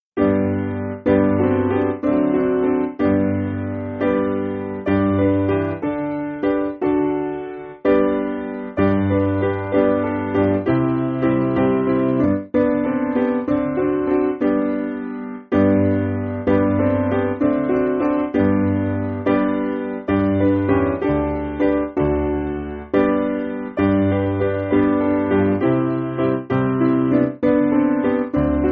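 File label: Piano, Backing Files for Churches; My life, my love I give to Thee; Dunbar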